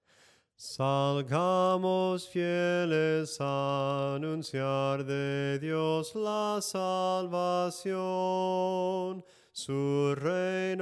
SOPRANO CANTADO; cantos del camino

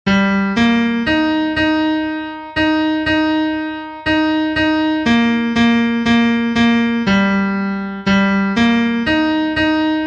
TENOR

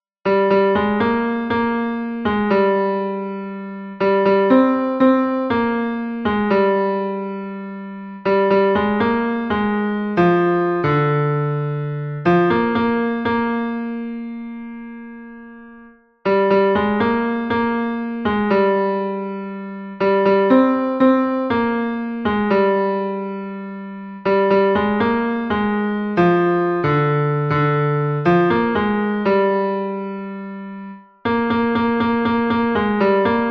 TENOR, himnos en piano; cantos del camino

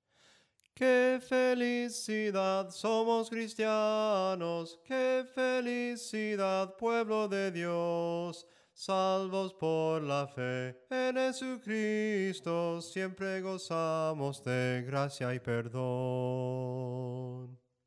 SOPRANO CANTADO, himnos en melodia soprano

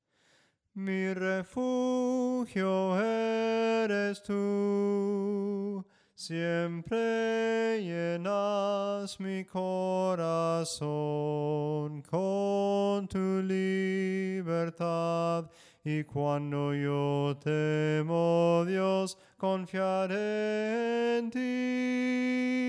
SOPRANO CANTADO, himnos en melodia soprano

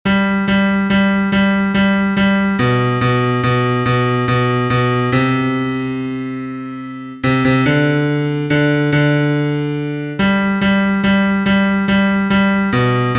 BAJO, himnos en piano; cantos del camino

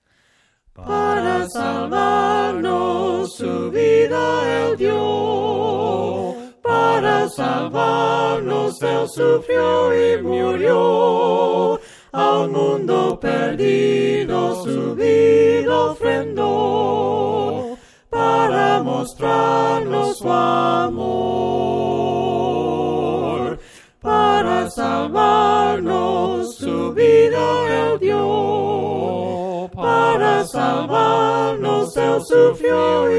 VOCES, himnos a capella; cantos del camino; PARA SALVARNOS

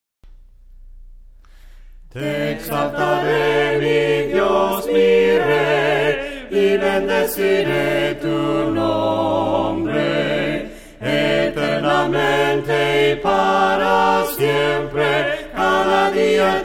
VOCES, himnos a capella; cantos del camino

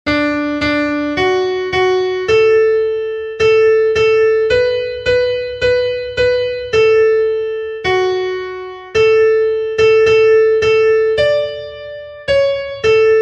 SOPRANO, himnos en piano; cantos del camino

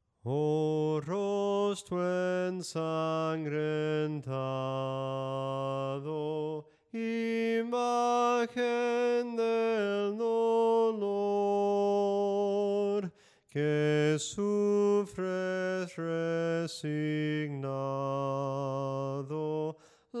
SOPRANO CANTADO, himnos; ¡OH ROSTRO ENSANGRENTADO

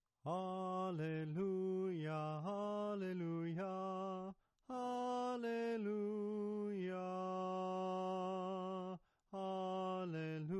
SOPRANO CANTADO; ALELUYA

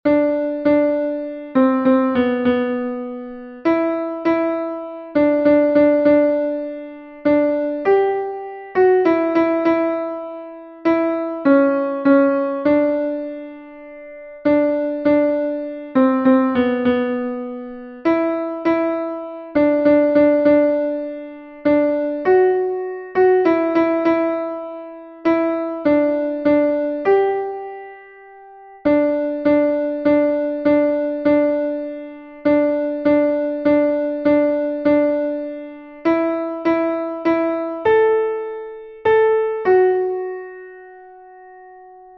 contralto, himnos en piano; 78 MARAVILLOSO ES EL; cantos del camino

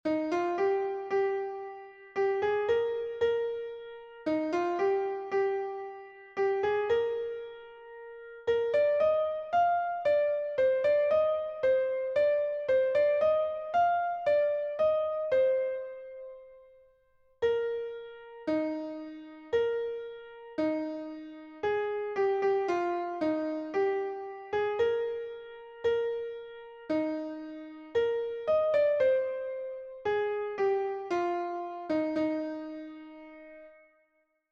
SOPRANO, himnos en piano; 56 INMENSA GRACIA; cantos del camino

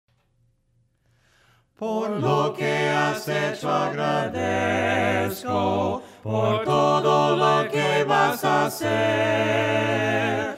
VOCES, himnos a capella; cantos del camino

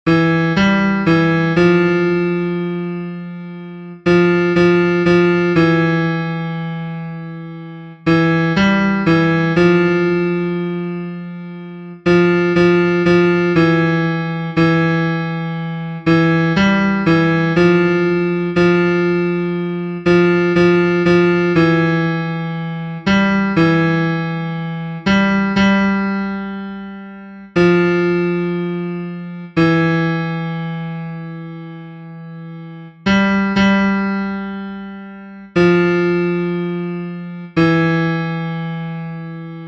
tenor, himnos en piano; cantos del camino

